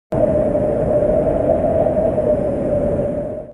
wind whoosh

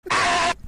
aaaahhhhhhhh; vinesauce

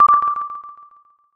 sonar

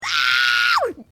screech

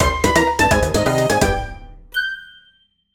death fanfare; kirby

